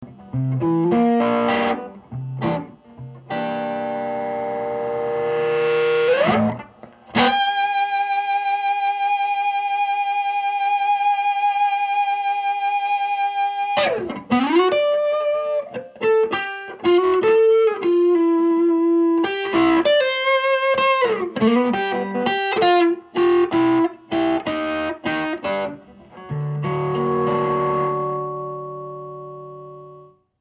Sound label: half G blues